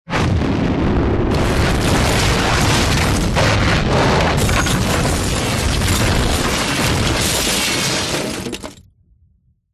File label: huge explosion; zapsplat